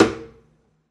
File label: pizz noise body slap high, double bass; dsmolken; noises